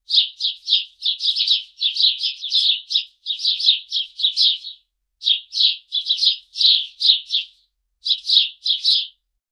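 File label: clean sparrow animal version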